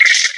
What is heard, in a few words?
box monster chitter